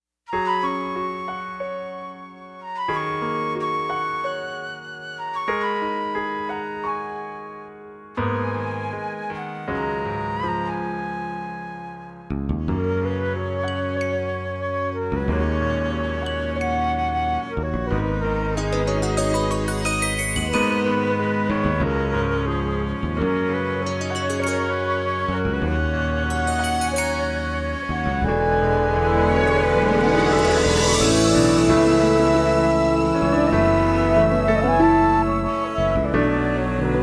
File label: karaoke